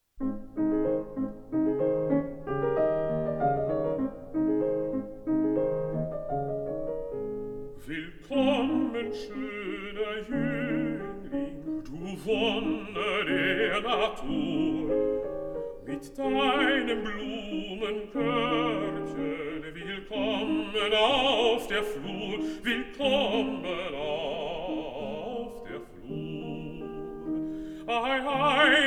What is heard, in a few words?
song for voice & piano